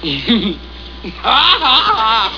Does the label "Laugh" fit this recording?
Yes